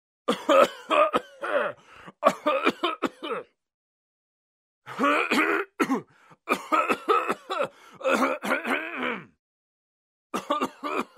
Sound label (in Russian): zvuk muzhskogo kashlja